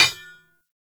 Ambient Blacksmith Hammer Impact; Sprout Farmers; Midrath

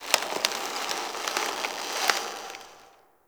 Root Cave Root Creak; Ambience; One Offs; Neathuns Root Cave

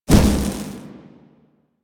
Jetpack Thruster Burst; Warframe; DE; Vehicles; Veilbreaker

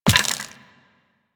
Walk Bone, Footsteps; Warframe; Operator

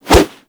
Brawl Whoosh B; Warframe; Brawling

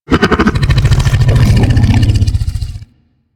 Vocal Captured Idle, critter; Warframe; Hunting Critters; Undazoa

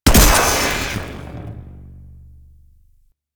Electro Mag Charged Shot, Weapons; Warframe; Corpus

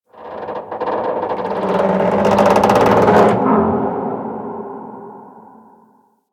Exterior Metal Debris Creaks; Props; Warframe; Railjack

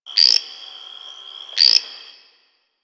Water Creature, Ambience; One Offs; Creatures; Warframe; Quests; The New War; Drifter Camp

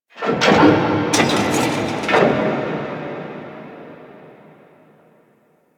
Mid Mech; Warframe; Guild

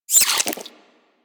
vocal pain, critters; Warframe; Hunting Critters; Nexifera

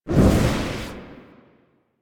Sticky Bomb Proj; Grineer; Veilbreaker; Vor

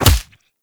Melee Fist Impact Flesh

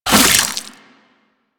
Inf Boomerang Fast Whoosh A; Warframe; Weapons; Infested

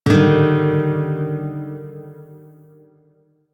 Shawzin Min Chord E; Music; Warframe

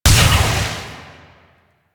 Shot Small; Weapons; Warframe; Duviri; Incarnon Adapters

